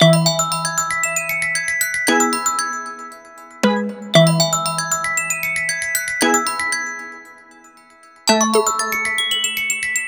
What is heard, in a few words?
Sonar, ringtones; Honor Pad X7